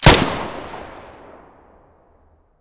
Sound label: muzzle mono